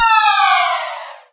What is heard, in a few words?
Ball Lost